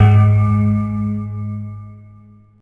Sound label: Percu; Revista CD Expert 50